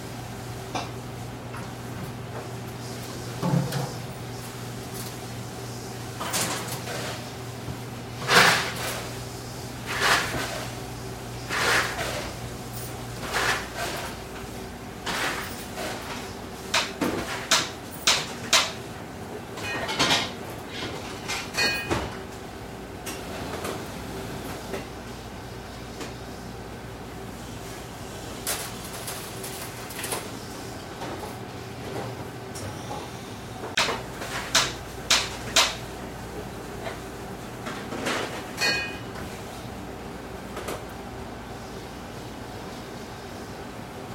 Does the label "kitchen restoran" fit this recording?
Yes